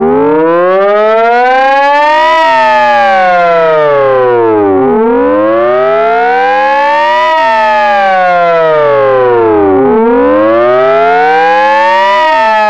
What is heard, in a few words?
fire truck piercer siren